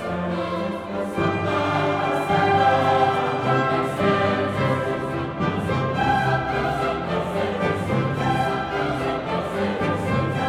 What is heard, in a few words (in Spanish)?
coro